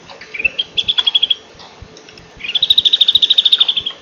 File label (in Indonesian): kepala botak